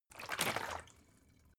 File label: bottle water big upend; berufe